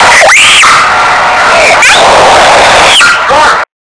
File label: water scream